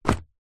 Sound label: laptop case fabric empty set down wood table; foley